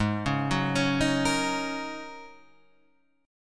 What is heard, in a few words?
G sharp 7th Suspended 2nd Suspended 4th G sharp Guitar Drop D